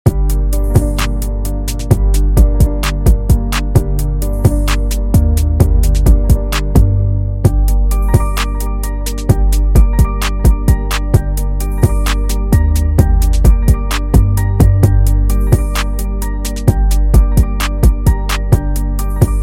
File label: trap beat 130 bpm